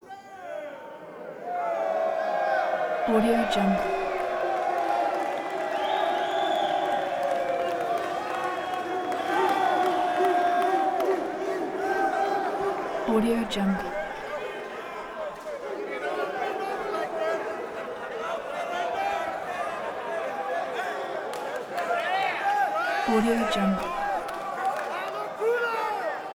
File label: prison crowd reverberant theater male inmates; prosoundfx